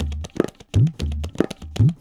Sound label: tabla 120